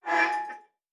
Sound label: Metal Foley Creak